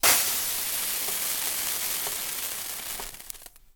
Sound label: Sizzles